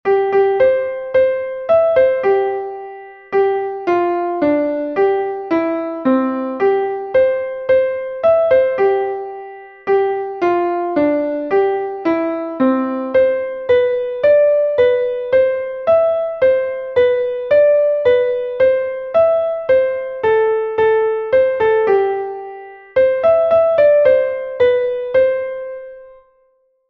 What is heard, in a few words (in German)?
klavier; die gedanken sind frei; melodiemeister